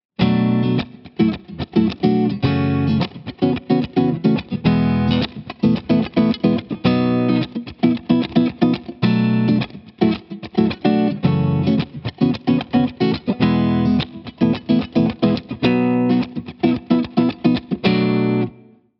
tab suspended

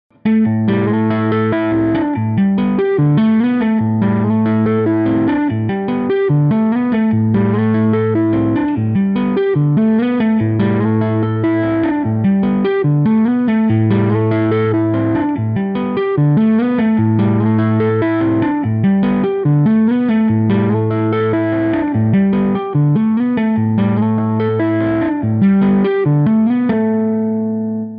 Tapping Lick; new Year

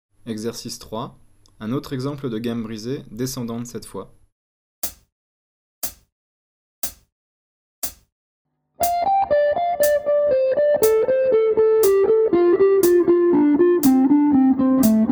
penta 3 notes

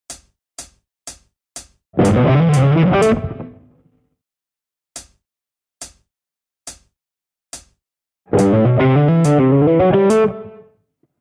legato

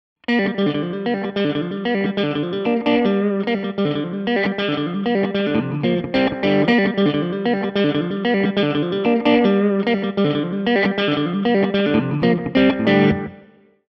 chicken picking

